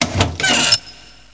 Sound Bad Tür

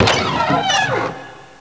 Kü Tür auf

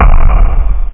rollo echo; Aminet; Puzzo Mania

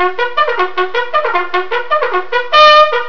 trompete

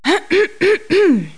falsch

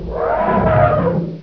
elefant

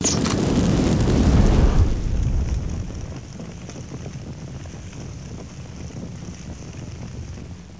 Kerzen

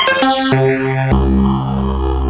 Synthi

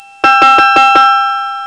glocke

